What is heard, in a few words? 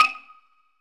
ding; HeavenStudio; flipper Flop